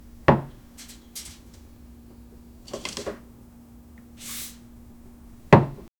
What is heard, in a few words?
a cozy kitchen with quiet, utensil clink